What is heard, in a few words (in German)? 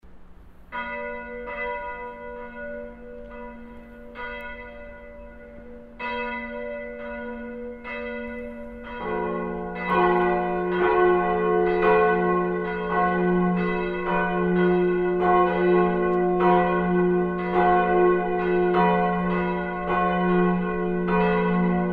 Glocken; Quart-Motiv („Wach auf!“); Sonntage Adventszeit; St. Lorenz